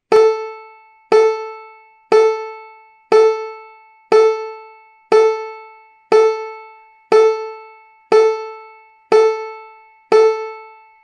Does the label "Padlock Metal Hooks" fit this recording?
No